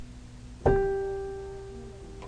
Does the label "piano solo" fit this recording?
Yes